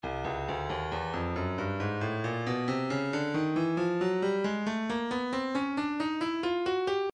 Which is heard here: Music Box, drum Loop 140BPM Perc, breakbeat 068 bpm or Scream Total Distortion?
breakbeat 068 bpm